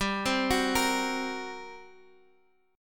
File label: G Minor Major 7th Double Flat 5th G, Guitar, Half Step Down